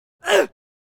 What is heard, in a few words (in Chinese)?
唔声